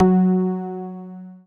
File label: Dance Floor Bass, Multisample Basses